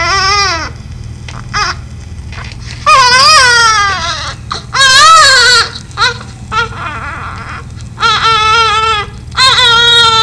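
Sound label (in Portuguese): down, sons e choros pediátricos; SÍNDROME DOWN; PEDIATRIA UFF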